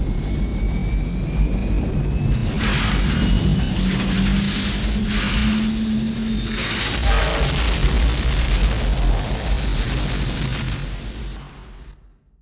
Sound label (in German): DUESEN; Best Service; Advanced Mega Effects